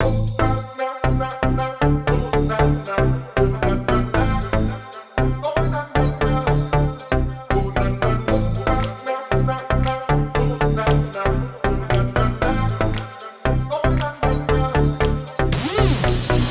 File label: house electronic techno; By Burak Sound Bank